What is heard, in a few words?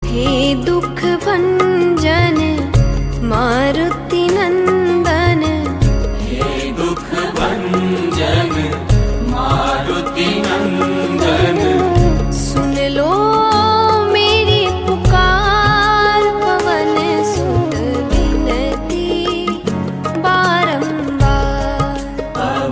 Hanuman Bhajan, ringtone